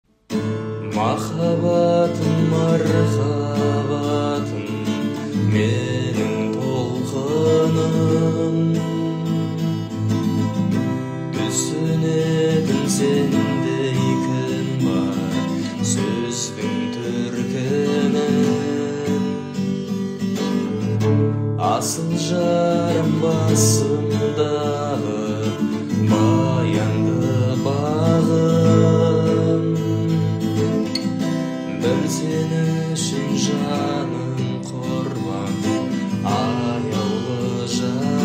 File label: cover by guitar